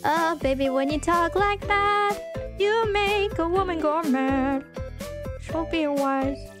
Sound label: Singing; IRySinging